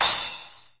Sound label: splash crash, drums single hits